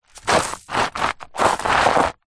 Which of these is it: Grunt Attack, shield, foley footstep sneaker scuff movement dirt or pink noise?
foley footstep sneaker scuff movement dirt